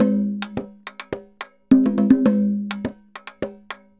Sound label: TAMBORA, MERENGUE; Best Service ProSamples vol.14; AKAI